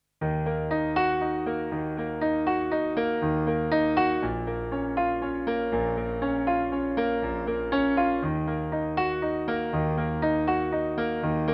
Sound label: piano